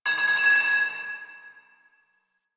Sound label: Pipe Cement Drop; Ambience; Warframe; Nineteen Ninety Nine